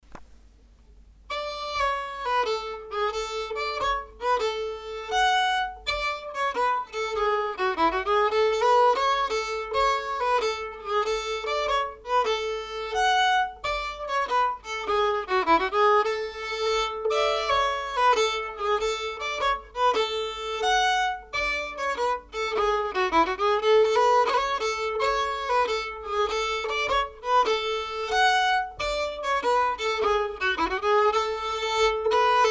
Stämma; herman strömberg

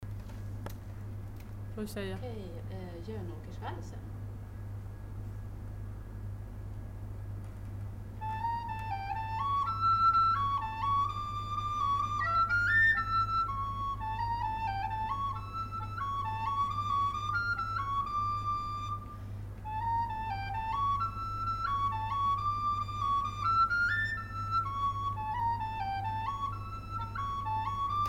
Jönåkersvalsen

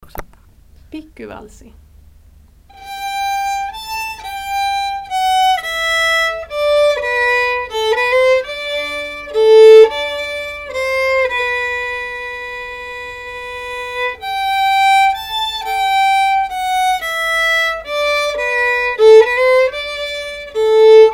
Pikuvalsi

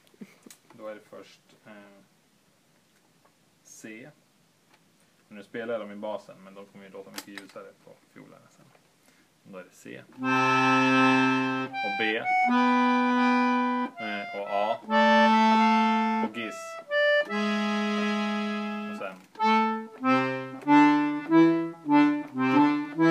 Vispolska från Forsa, basgång

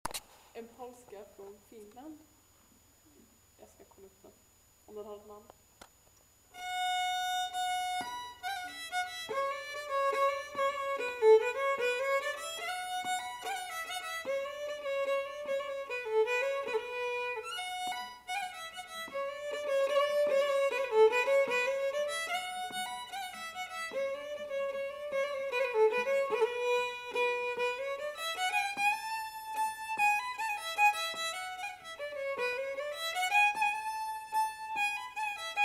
Vals från Finland

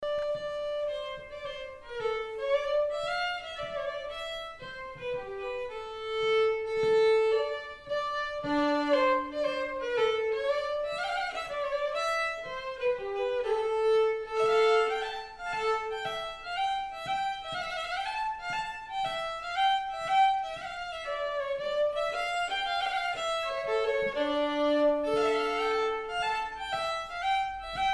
Polska

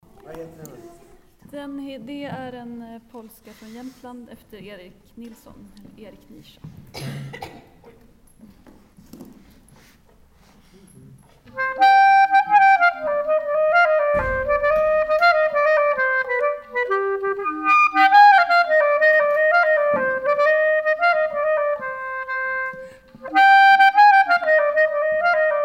Polska

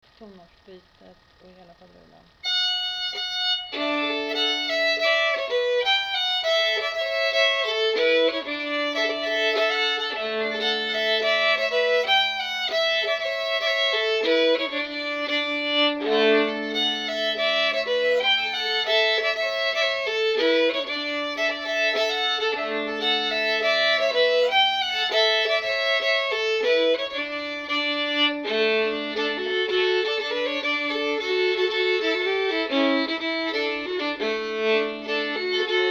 overgang polsmedley

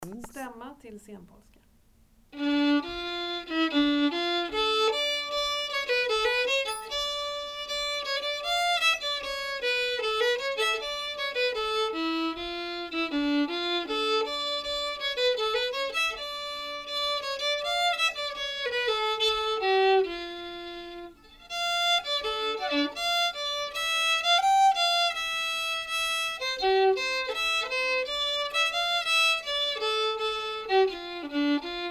Stämma senpolska

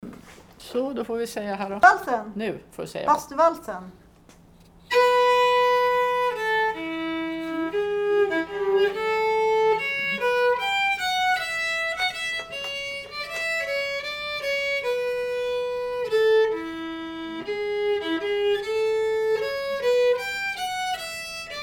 Bastuvalsen

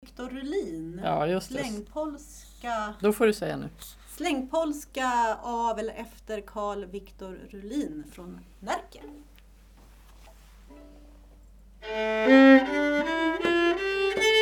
Slängpolska

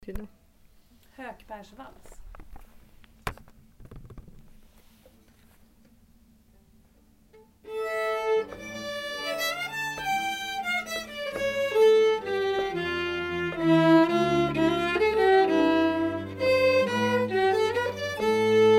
Hökpers vals

